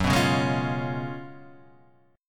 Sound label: F sharp Major 7th Suspended 2nd Suspended 4th F sharp, Guitar; Half Step Down